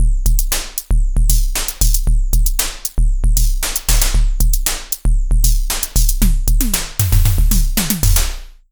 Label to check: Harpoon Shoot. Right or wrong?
Wrong